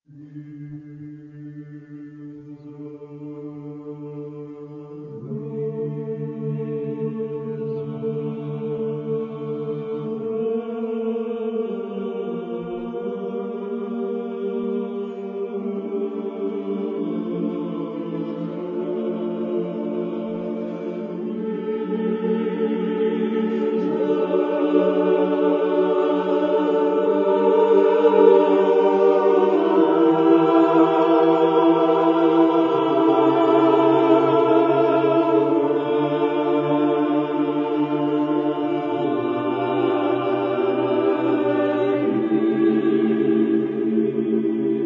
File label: choir masterpieces